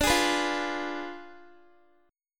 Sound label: D Diminished 7th, Guitar; strummed; x,17,18,16,17,x; 4E,4A,5D,5G,5C,6F; Ddim7